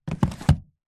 household book large old hardback set down on hard surface